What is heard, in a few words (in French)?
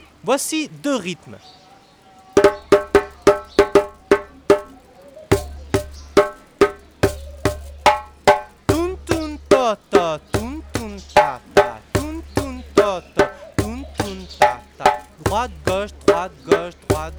Deux rythmes pour les enfants; Studio les Trois Becs